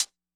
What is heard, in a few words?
swing hihat; Hiphop